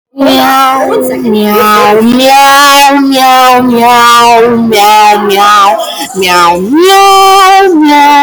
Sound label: goofy meowing